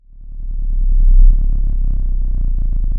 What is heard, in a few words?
pop, Sound of an engine revving